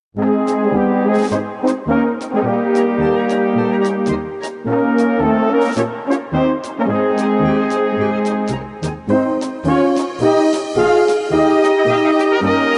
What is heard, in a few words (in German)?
Polka